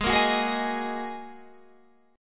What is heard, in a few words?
G sharp 7th Suspended 2nd G sharp Guitar Standard; G#7sus2 strummed; JGuitar